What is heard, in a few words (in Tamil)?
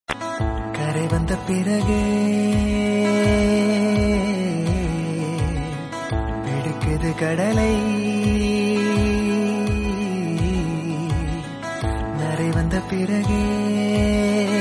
tamil; 96 movie